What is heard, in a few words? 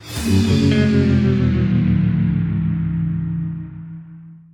Stinger; I Expect You To Die 2 gamerip